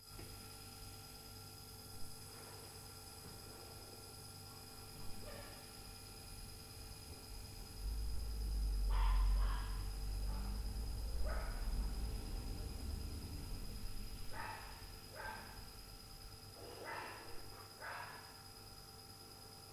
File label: Fridge + DOG + 2x DOOR NOISE, Night Noise